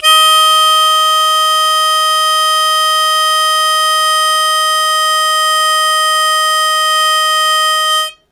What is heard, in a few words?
Loud Attack Sustain Eb2, Harmonica; Zero G - Harmonica